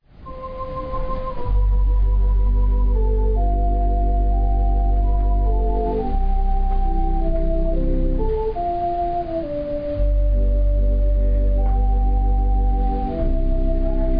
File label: Organ and violin prelude, Opening worship